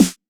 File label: TR909 Snr, drum machines; Drumset 03